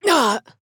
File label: Death; Ying; Paladins